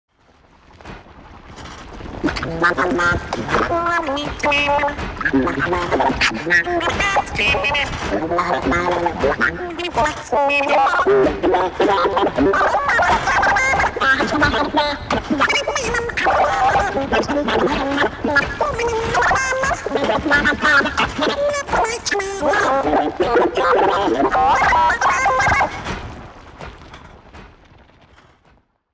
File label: Pinging Marciale (Rolling)